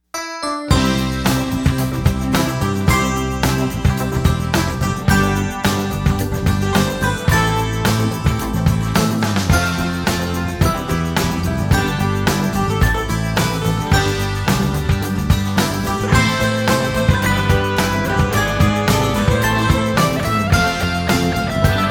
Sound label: Instrumental; Laugh-N-Learn